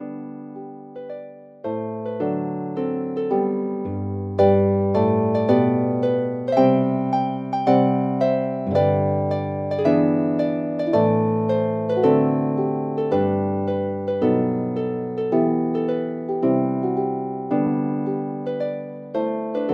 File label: Harp; Famous Music for the Harp Vol 6